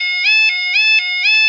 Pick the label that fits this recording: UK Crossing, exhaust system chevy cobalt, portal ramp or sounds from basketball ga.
UK Crossing